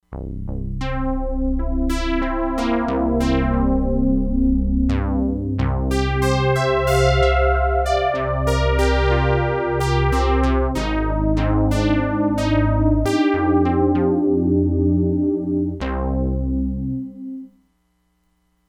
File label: TWANG, Crumar Bit 99; Factory Programs